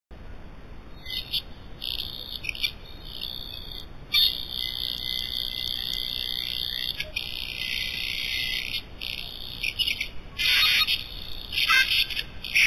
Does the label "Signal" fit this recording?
Yes